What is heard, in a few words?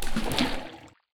water enter